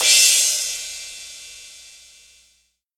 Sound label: Crash Sample G# Key, Royality free crash sound sample tuned to the G# note. Loudest frequency: 6013Hz